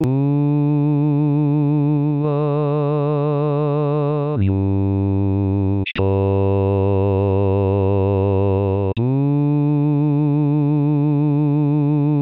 basse; rimailleurs

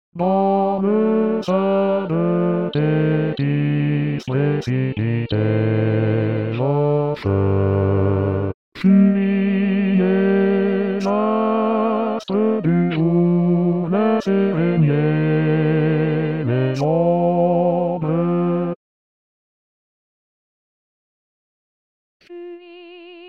sein thetis basse; 2022 st jean

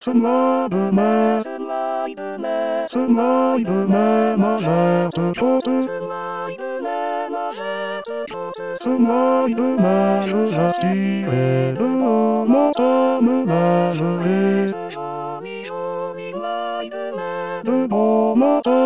basse; mai

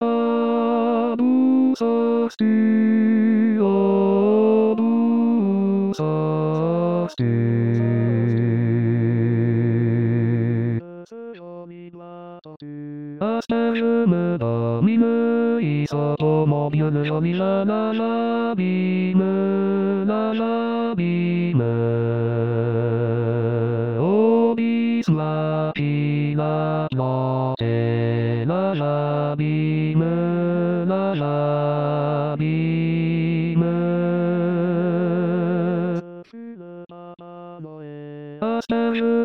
basse

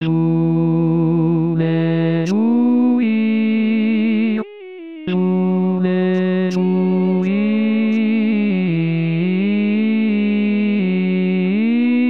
basse

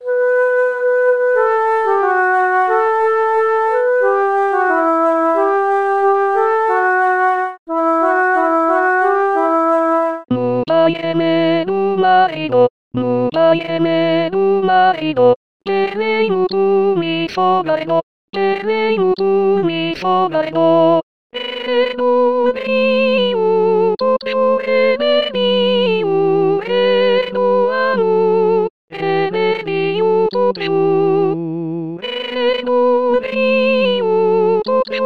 erba femmes; 2023 st jean

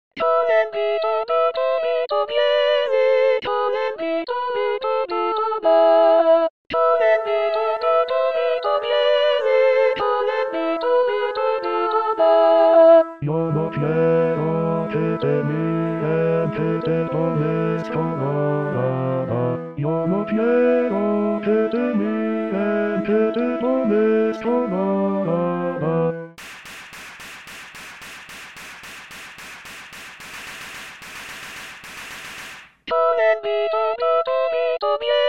vito bas; 2023 st jean

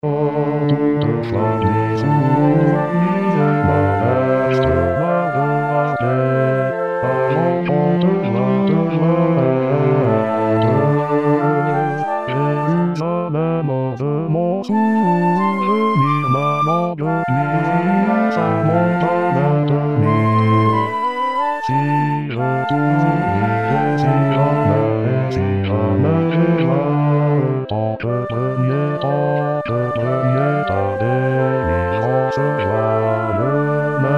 basse

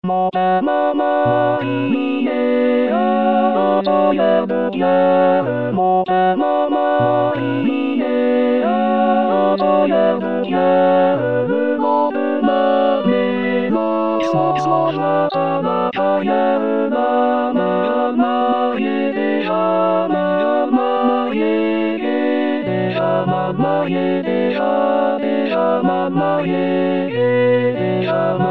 tutti; deja mal mariee; 2026 saint jean

